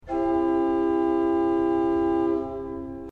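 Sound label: voxhumana 8 C, organ; srednja vas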